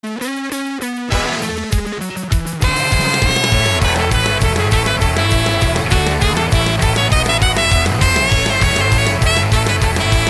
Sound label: jingle bells remix, ringtone